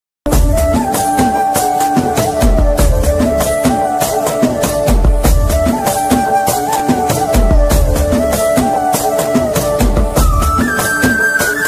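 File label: background music ringtone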